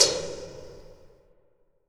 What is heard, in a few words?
WATER TANK, Impacts, Hits & Booms; Distored Reality 1&2